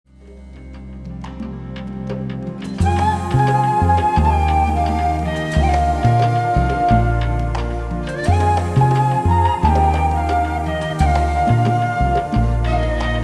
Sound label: Rumba